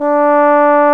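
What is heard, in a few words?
brass trombone; Roland L-CDX-03 Disk 2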